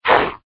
zombie hit; Zomboid 41.78.16; Project Zomboid

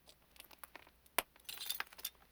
Walk On Broken Glass; Zomboid 41.78.16; Project Zomboid

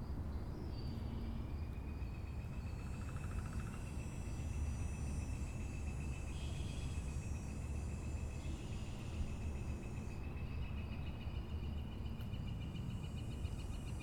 ambient Outside Forest Evening; Zomboid 41.78.16; Project Zomboid

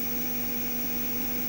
drive spin A500; uae

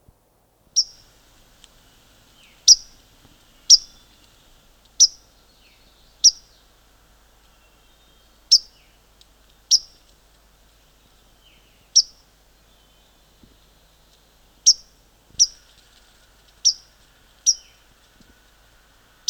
bird; Zomboid 41.78.16; Project Zomboid

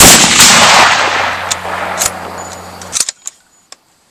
varmint Shot; Zomboid 41.78.16; Project Zomboid

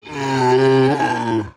Male Death; Zomboid 41.78.16; Project Zomboid

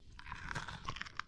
zombie bite; Zomboid 41.78.16; Project Zomboid

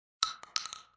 gauge shell; Zomboid 41.78.16; Project Zomboid

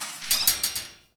Animal Trapped Metal; Zomboid 41.78.16; Project Zomboid

